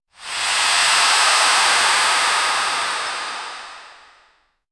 soft whoosh and air displ

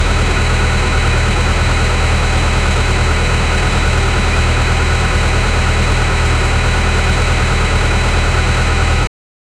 turbo spool sound without

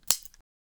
punch; human interaction

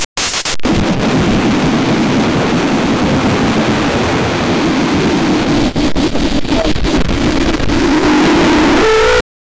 tulle tearing crinkly tea

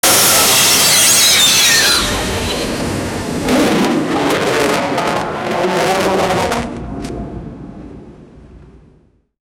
plane crash sound and ppl

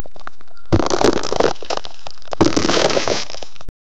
heavy walking sound, boss; sound effect

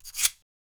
paintbrush stroke, painting

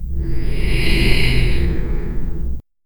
vampire coming closer mak

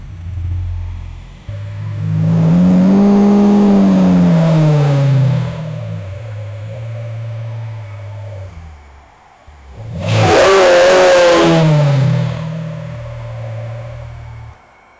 a powerful lions roar

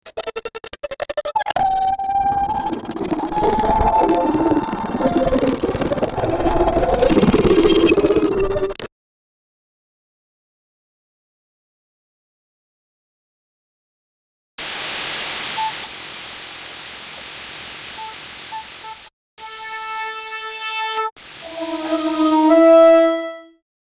nature cartoon animation, bird chirping

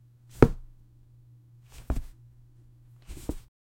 cardboard box soft palm

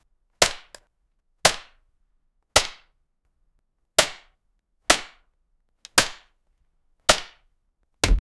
shotgun sounding punches

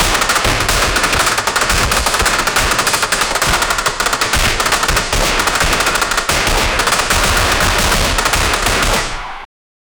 chaos in the battlefield

